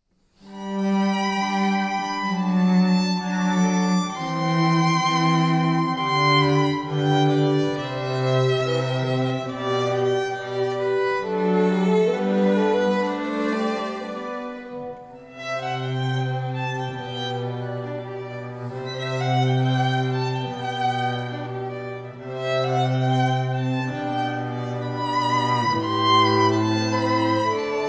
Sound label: string quartet; red rose string quartet